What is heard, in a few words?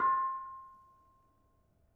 Bonang Panerus Pl 7, gamelan; gravityharps; timbrebox